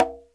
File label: SLAP HI CONG; Crypton - DrumBase5000; CD DRUMS #2